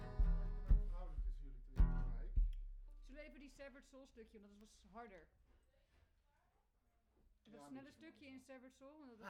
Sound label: Chordz